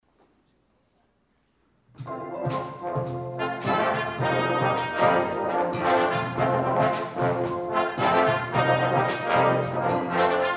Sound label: Praise His Name Contemporary Brass